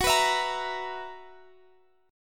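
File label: B Major 7th Suspended 4th, G flat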